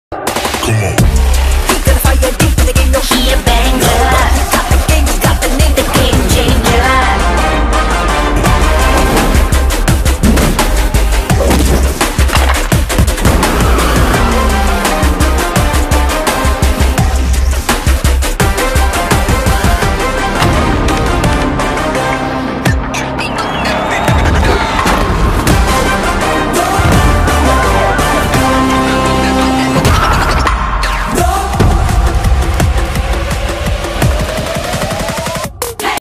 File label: teaser bgm ringtone; game changer